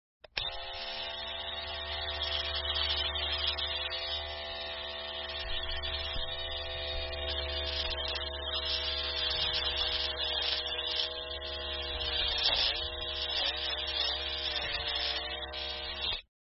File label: Bathroom Electric razor